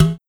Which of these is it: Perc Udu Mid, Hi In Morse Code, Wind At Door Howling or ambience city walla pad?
Perc Udu Mid